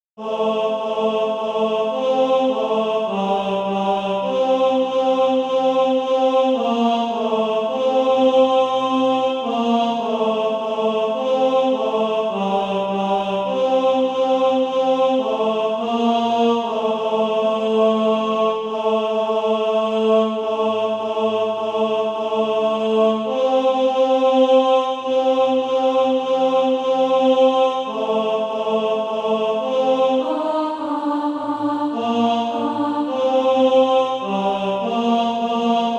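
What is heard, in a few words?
tenor; Every Day Will I Bless Thee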